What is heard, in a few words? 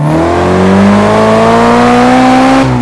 second, vehicles m6; gmod; tdmcars